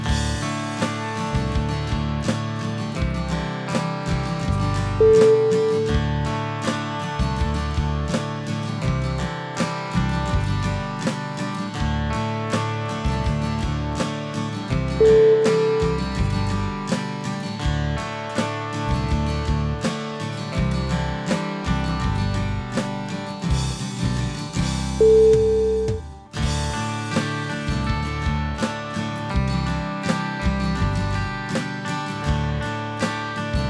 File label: southern skies